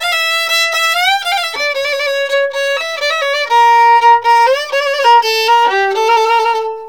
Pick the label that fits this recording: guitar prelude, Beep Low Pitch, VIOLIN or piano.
VIOLIN